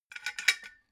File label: metal scaffold pole splint; industrie-wirtschaft